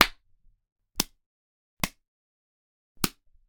slaps; human; NS Studios - ultra sound collection

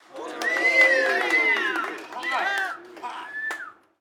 street ball crowd cheer low; SFX Kit; Crowds

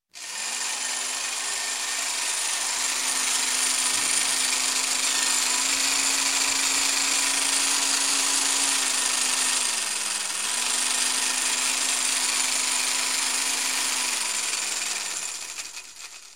Construction, Saw Jigsaw Start, Run, Stop; Sound Ideas 2000 Series

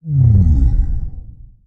Scifi Forcefield Deactivate; SFX Kit; Energy & Forcefields

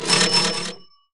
Pickup Coins; SFX Kit; Pickups

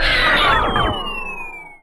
Scifi Power Down; Energy & Forcefields

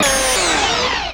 Scifi Alien Energy; Energy & Forcefields

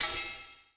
Pickup Magic; SFX Kit; Pickups

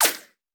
Bullet Ricochet; Sound Ideas; Guns

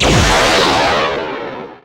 Rocket Launcher Shot; SFX Kit; Weapons

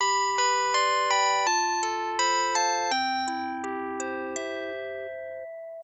phone ringtone

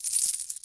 coin; firefight

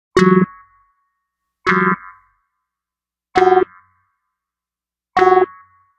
Arcade Click Negative

